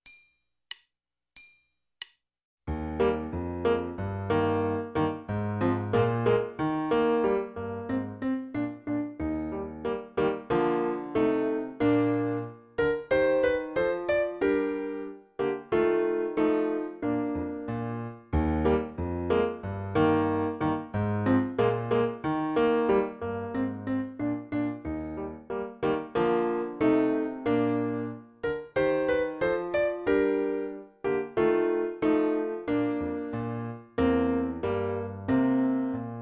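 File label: Allegro, Bassoon, Alto Clarinet, Alto Saxophone, Baritone Saxophone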